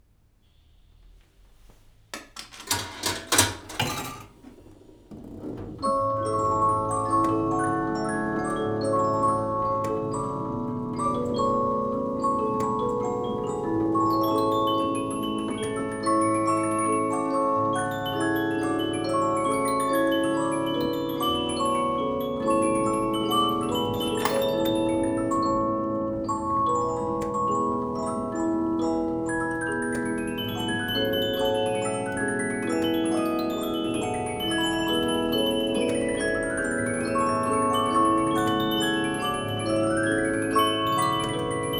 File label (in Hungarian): zenedoboz fémlemezes polifon pénzbedobós; lipcse; keszthely; verkli